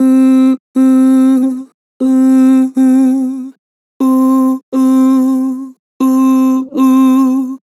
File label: Vibrato "Ooh", Harmor Vocals